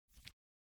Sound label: swipe